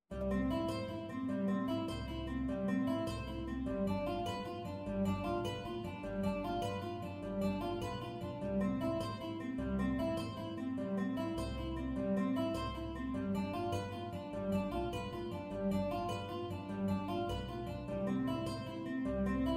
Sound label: Tenor ukulele